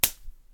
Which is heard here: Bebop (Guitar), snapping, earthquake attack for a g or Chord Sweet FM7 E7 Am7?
snapping